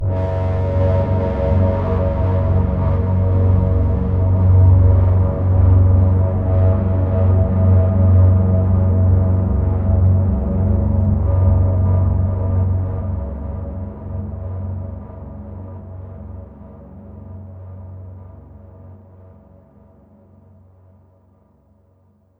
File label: Overlay Drone